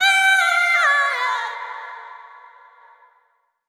Fem Vocal 128bpm G FX, African Voices

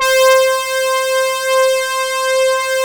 SYNHORN; Greytsounds; Scott Peers Sound Engineering

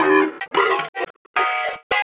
Guitarlike, Leads And Riffs; Sonic Foundry (Sony Creative Software); Synchro Funk

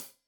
Hat Tight, Hi-Hat; Deeply Sampled Drums

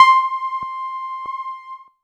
C5, Roland JV 2080 TECHNO PAD

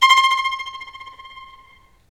STR Violin, Solo Violin FX

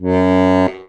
STEIRI F#1, STEIRISCHE

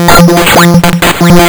lsdj waves; wavetables

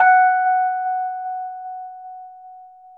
Rhodes 73 F#; E-MU Producer Series Vol. 5 – 3-D Audio Collection; 3DRhodes

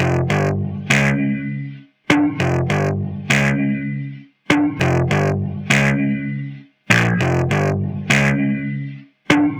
Distort bass; Sonic Foundry (Sony Creative Software); Ugly Remnants VOL-2

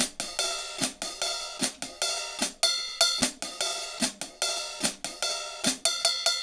HAT, HI HAT LOOPS, Dub; PERC LOOPS; Drumdrops In Dub VOL-1

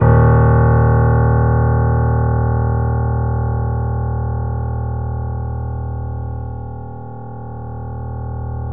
D#, Piano; InVision Interactive; The Piano

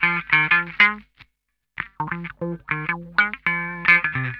OLD TIMER, Funk Guitar; Zero G - Funk Guitar

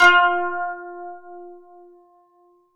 KANUN F4; SoundBytes; Early Patches; KAN CHOR BIG

